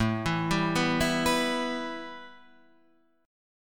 A 7th Suspended 2nd, A, down

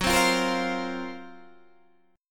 Gb Major 7th Flat 5th Guitar Open D

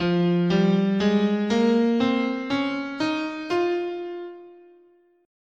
F Aeolian, scale

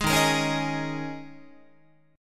D sharp 7th Suspended 4th, Guitar; D#7sus4; strummed

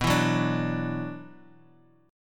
E 7th Suspended 2nd, B, chord

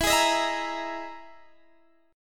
E Major 7th Flat 5th, Guitar, Standard; EM7b5 strummed; x,19,18,20,17,18; JGuitar

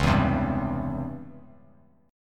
C sharp Minor Major 7th Flat 5th Bass Standard; C#mM7b5 strummed; 9,7,10,0; JGuitar

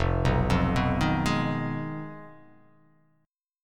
F Minor 7th Flat 5th F down

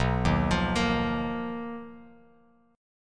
B Major Sharp 11th B, Bass Standard; BM#11; arpeggiated; JGuitar

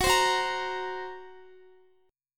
F sharp Major Flat 5th F sharp Guitar; F#Mb5 strummed; x,x,18,17,18,18

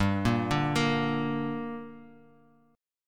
G Minor G, Guitar, Half Step Down

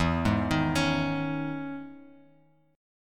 E Major 13th down Bass Standard; EM13 arpeggiated; JGuitar

